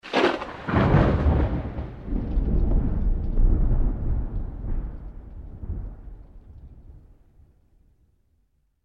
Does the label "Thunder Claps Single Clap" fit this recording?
Yes